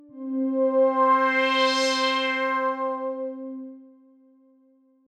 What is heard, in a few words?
Sweep; opxpro2; Riddle